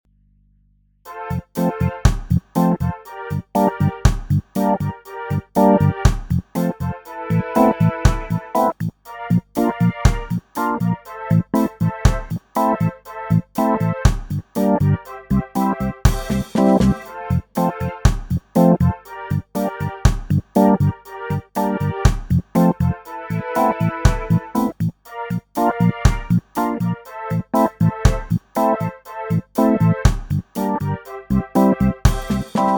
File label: reggae winds